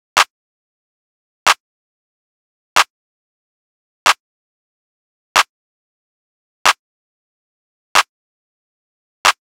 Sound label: Drum Loop - 185 BPM Clap; Drum Loops; Loop Stems; Cymatics; Mystery Pack Vol 4; Stomp It Drum Loop